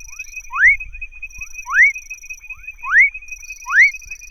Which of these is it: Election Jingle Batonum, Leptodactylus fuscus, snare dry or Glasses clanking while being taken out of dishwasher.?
Leptodactylus fuscus